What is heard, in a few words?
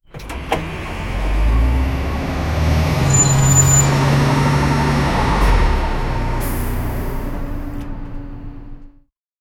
bus arriving stopping bus